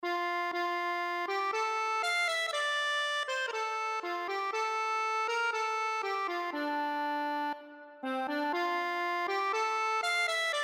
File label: kissing sweet lips, accordion